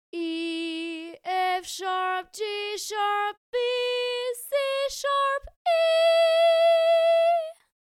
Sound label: sung scale